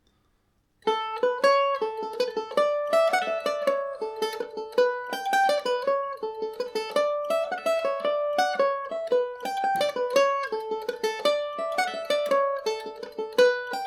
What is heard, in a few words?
jig, mandolin; The Thornton Jig; G&O #34 mandolin